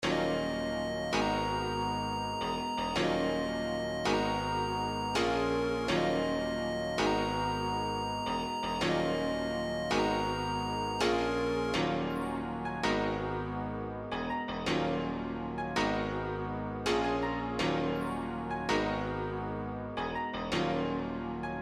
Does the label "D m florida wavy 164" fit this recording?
Yes